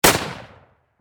Warfare Rifle .30 Caliber M1 Garand Semi Single Shot Close Perspective; SFX Bible 6